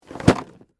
Cardboard Box Over, Foley, Cardboard, Box, Knock, Over; Cardboard (Sound Effects:Materials); BlastWave